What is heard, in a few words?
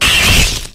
screech attack; roblox doors